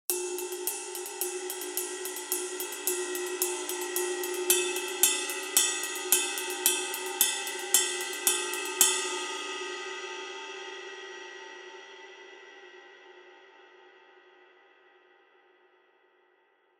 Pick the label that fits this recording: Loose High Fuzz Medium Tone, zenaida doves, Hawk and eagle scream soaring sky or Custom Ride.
Custom Ride